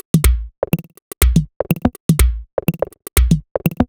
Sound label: Top 123bpm, House Drumloops